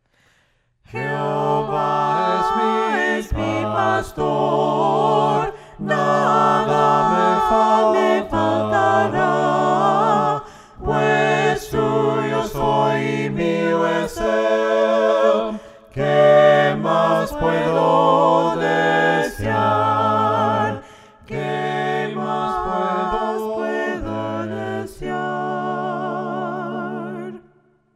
JEHOVA ES MI PASTOR VOCES, himnos a capella; cantos del camino

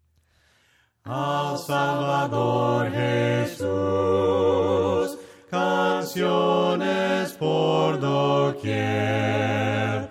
VOCES; AL SALVADOR JESUS

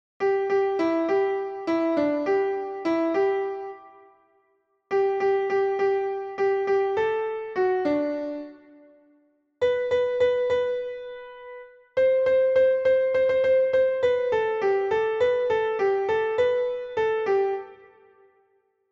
SOPRANO Y CONTRALTO, himnos en piano; VAMOS A CANTAR; cantos del camino

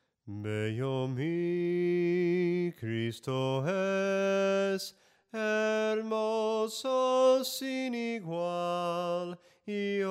SOPRANO CANTADO, himnos en melodía soprano; BELLO MI CRISTO ES; cantos del camino

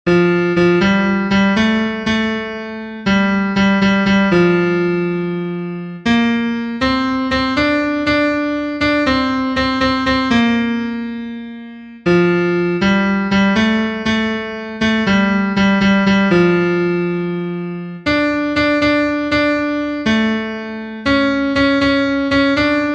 TENOR, himnos en piano; cantos del camino

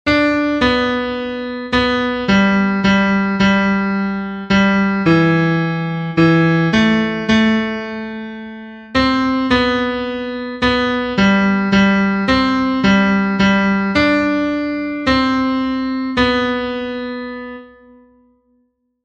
TENOR, himnos en piano; cantos del camino

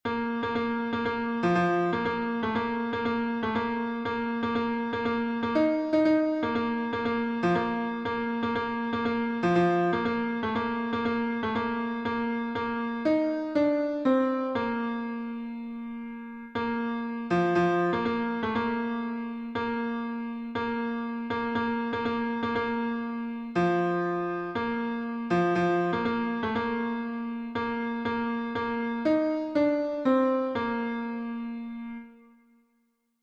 TENOR, himnos en piano; cantos del camino

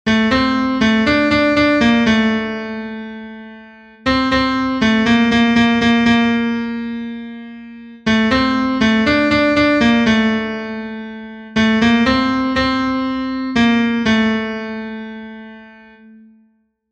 tenor, himnos en piano; AMA SI QUIERES SER FELIZ; cantos del camino

